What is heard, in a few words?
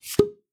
pop supon low